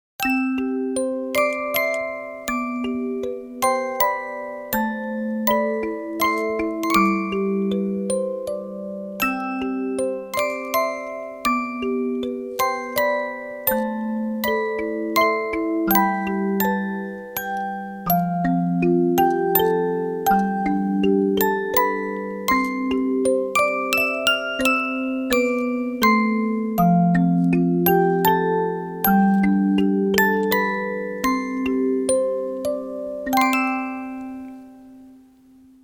Music Box; My Time at Portia

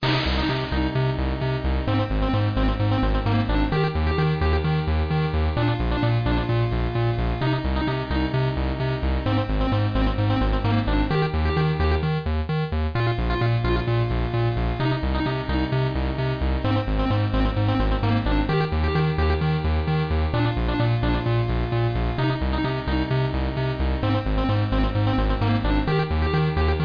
Hard Times NES

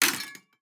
glass hit

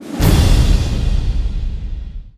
Game Start; Tank Troopers; 3DS gamerip 2016

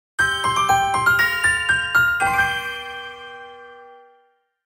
School Time; Yo-kai Watch Jam Yo-kai Academy Y Waiwai Gakuen Seikatsu; PS4, Switch

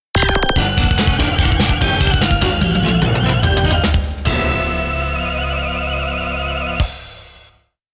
Midi Roland SC-55 Version; The Bathead (Start Demo); Castlevania Chronicles Akumajo Dracula Original Soundtrack

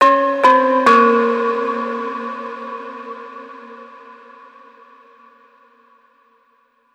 Overlay Tone

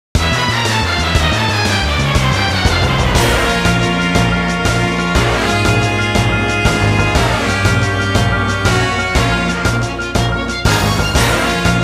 Music Success event

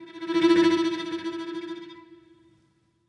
Music Level Argument Stinger; Krish

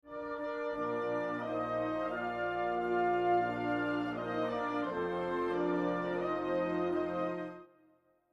lose jingle

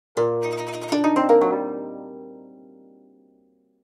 Dialogue Box (Gayageum); B Path of the Teal Lotus

